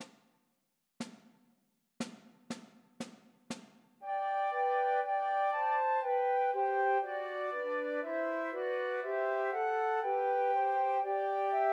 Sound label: Trios for Three Flutes; Good King Wenceslas; Christmas